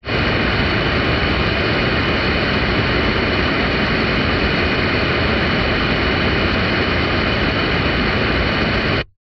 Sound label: transport car radio static med burst by speaker